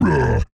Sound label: ogre attack; The Monster Plane; kolmods preatt